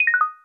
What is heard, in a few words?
drip falling